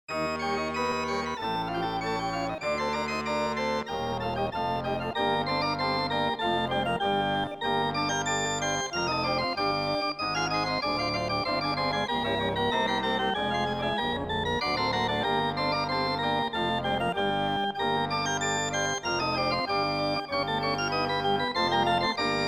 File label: OrganPipe